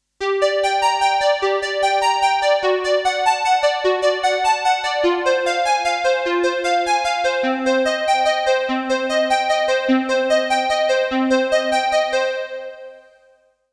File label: Roland JX 08